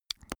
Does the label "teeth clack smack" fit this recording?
Yes